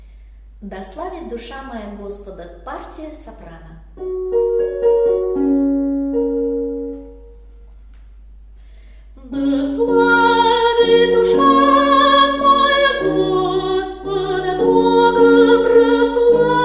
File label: soprano; epiphany; da slavit dusha moya